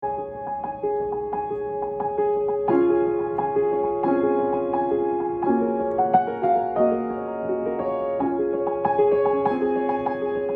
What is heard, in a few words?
Piano